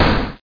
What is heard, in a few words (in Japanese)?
ATARI